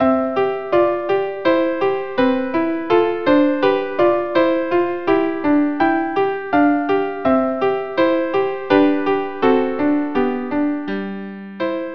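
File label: violin duo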